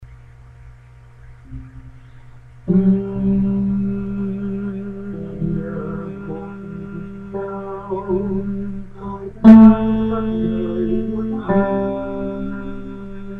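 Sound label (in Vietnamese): Chúa Ra Đời, Tenor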